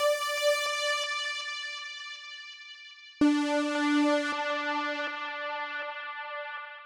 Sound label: Synth Riff D, 140bpm; Zero-G Sound Sense Grime Scene; GS Construction Kits; Just Flex